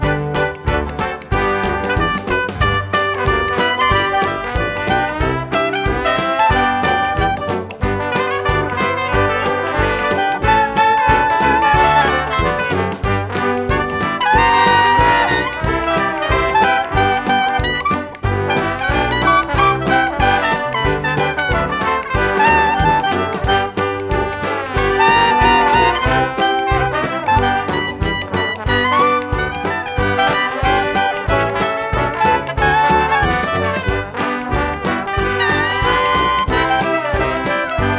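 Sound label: sax instrumental only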